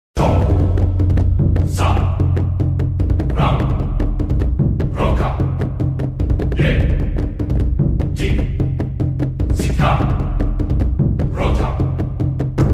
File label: War song; Mando